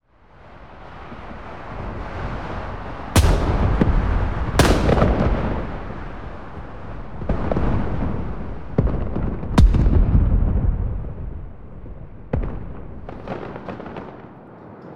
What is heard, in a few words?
fireworks